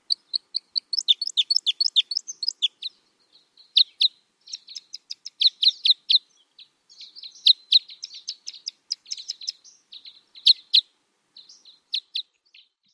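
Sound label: song and calls, Red Crossbill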